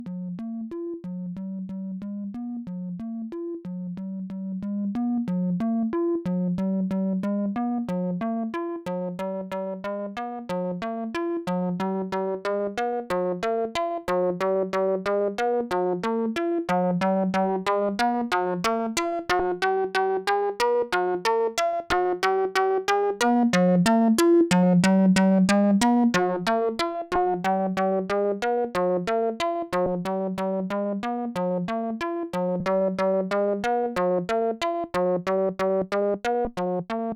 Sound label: cre8audio West Pest Sequence